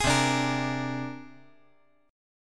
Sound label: C 7th Suspended 2nd Sharp 5th C down